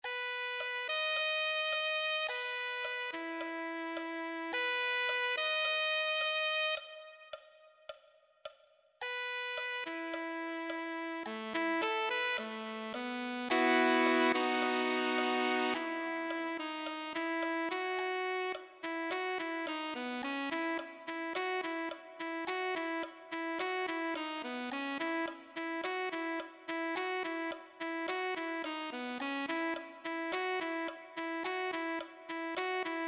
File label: alto saxophone